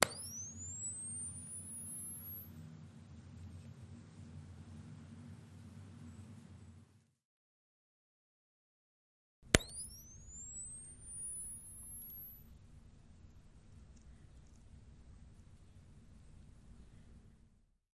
Camera Flash Reloadin

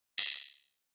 Thin Elect Spring Rev

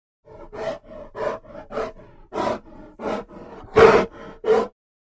Bear Grizzly Breaths

